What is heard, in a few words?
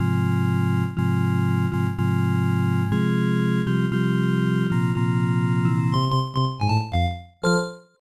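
Game Over (Marche Funèbre); King's Quest II Romancing the Throne; Apple IIGS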